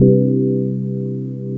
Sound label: chord, 150 BPM; Ueberschall; Drum & Bass Resonance